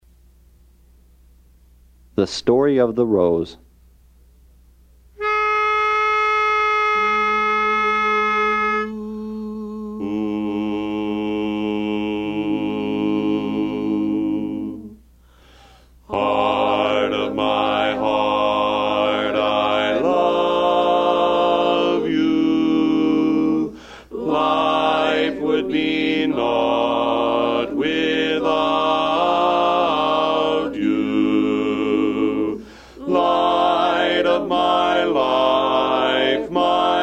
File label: Bass